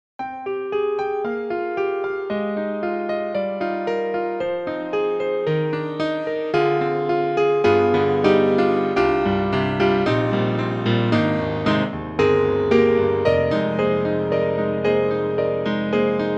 Kontakt Factory Library (Orchestral) Concert Grand; Native Instruments